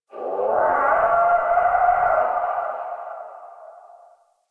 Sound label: Landscape Distant Creatures, Ambience